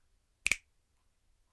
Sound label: Snares & Claps, One Shots; Touch Loops; CosmicFunk